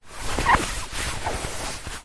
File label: household small foam chair fabric movement; zapsplat